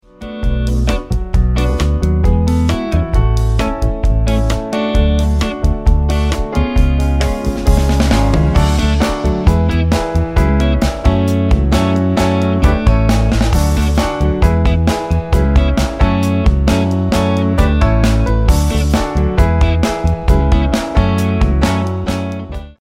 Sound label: 133BPM Fm